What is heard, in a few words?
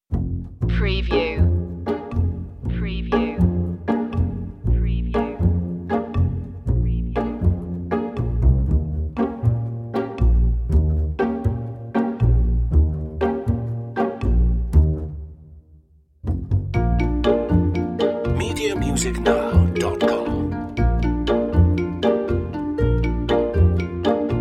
no perc